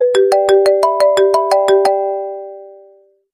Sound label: ring; apple